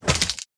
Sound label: Action Hit; SoundsCrate